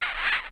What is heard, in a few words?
Kiss, Yamaha DD-35